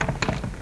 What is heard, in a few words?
Debris; Princess and the Pea; SoftKey